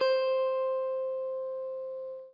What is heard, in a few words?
Guitar & Bass; Quantum Leap; 90's Sample CDs